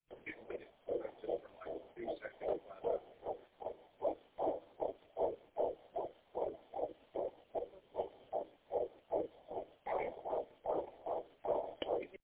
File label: baby heartbeat, fetal heartbeat; lillasie